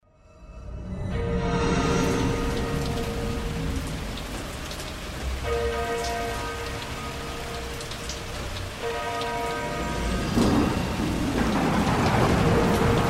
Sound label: Lightning Strikes; SONIDOS TERROR